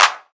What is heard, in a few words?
hand clap D key, Royality free hand clap sample tuned to the D note. Loudest frequency: 2915Hz; single hits one shots